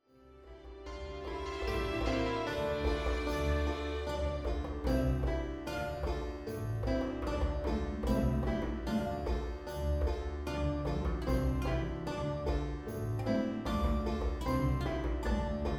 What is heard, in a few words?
Traditional Sephardic Tune; Morena Me Llaman